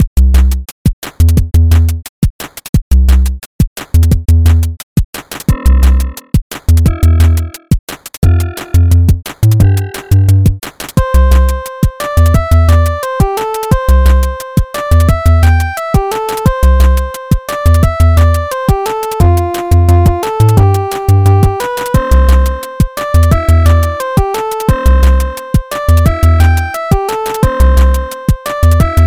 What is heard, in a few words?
Chiptune